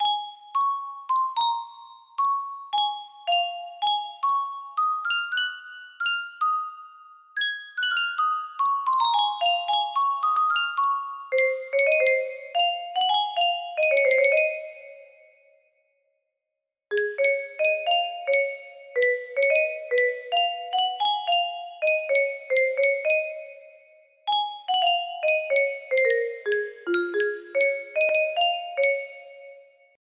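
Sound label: korean national anthem, music box melody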